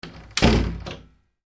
household door double glass panel internal shut, Wooden Glass Panel Double Door Shut; Doors Close - Wooden Glass Panel Double Door Closing; Sliding Doors; McKinneySound